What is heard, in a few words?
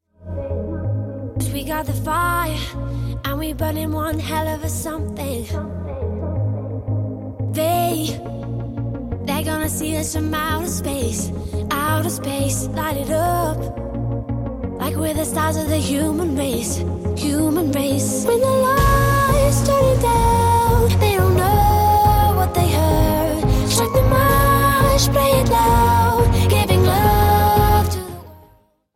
howl no strings